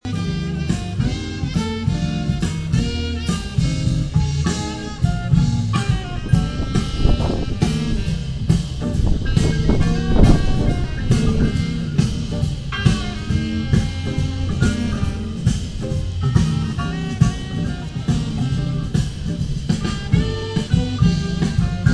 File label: great jazz; great hill